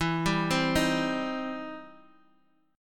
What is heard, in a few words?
E Minor Major 7th Sharp 5th E